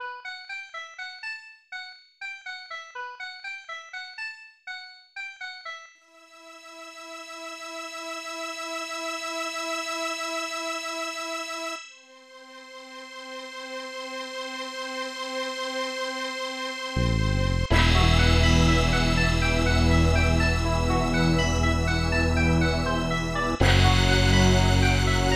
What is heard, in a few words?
Dungeon; 3x3 Eyes: Seima Kourinden; SNES